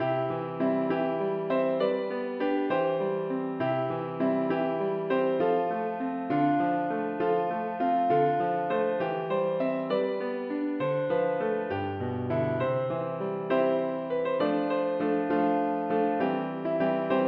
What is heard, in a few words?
piano